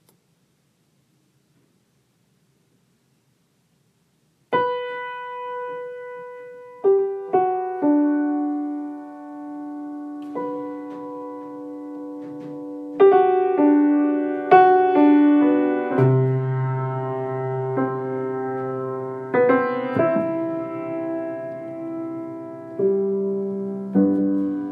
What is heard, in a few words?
Triangle In G